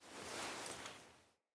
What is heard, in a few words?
Sparrow Landing Foley Lower Arm; Dendria; Envoy